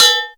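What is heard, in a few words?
BELL, percussion kit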